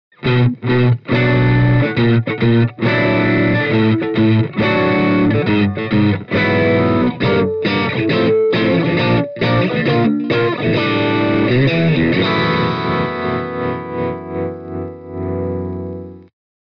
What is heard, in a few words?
Eminence Legend GB128 Crunch Stratocaster